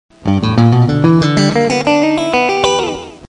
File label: open strings, guitars